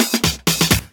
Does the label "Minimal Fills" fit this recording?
Yes